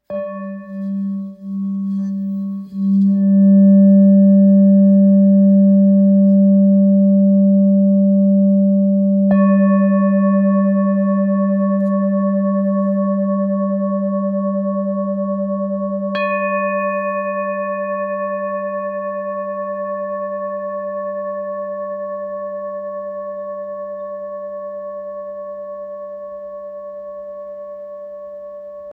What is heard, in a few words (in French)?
Bol bengale sol